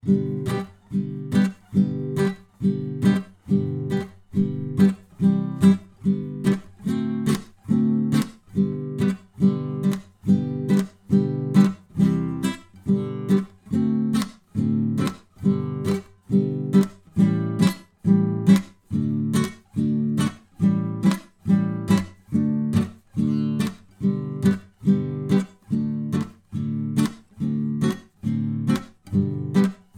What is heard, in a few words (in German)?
Gitarrenbegleitung